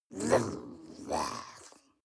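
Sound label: witch drool slobber, creatures; kreaturen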